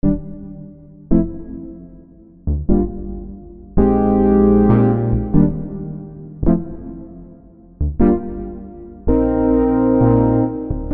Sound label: Keybrass; XILS